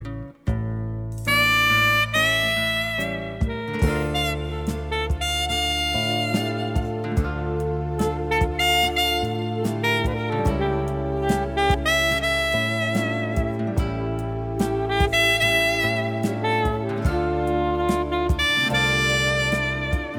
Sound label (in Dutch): Saxofoon